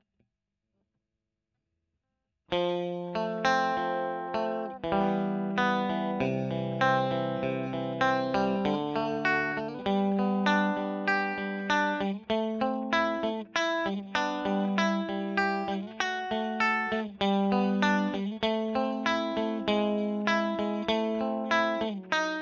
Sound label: E.GTR; Royer